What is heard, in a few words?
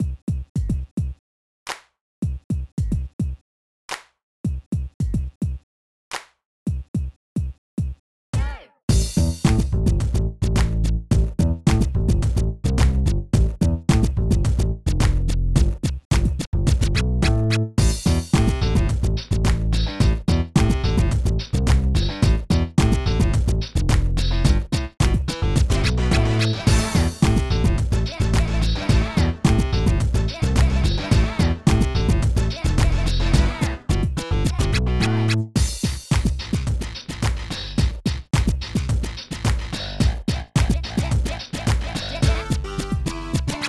BPM108; Scribblenauts